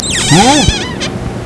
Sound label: SQUSQUID